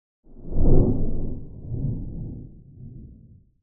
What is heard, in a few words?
Whoosh Deep Wind Low; Hollywood Edge; sound designer's toolkit v2